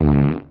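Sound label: Cartoon Farts Low Pitch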